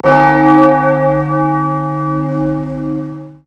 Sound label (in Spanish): campanas